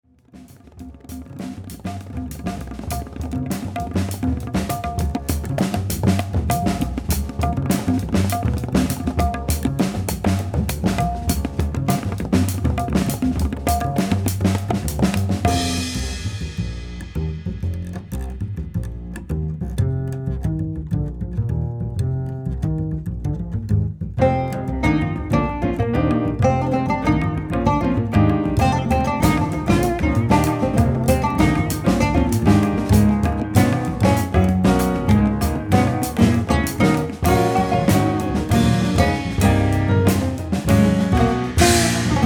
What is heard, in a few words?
Nu Bass